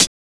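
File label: hi-hat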